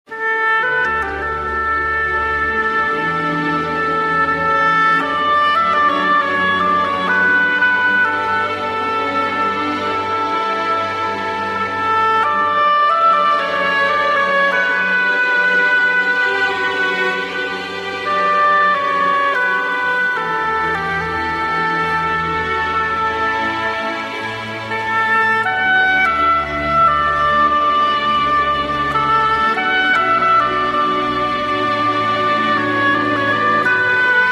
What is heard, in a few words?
ringtone oboe